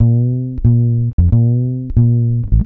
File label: ACID JAZZ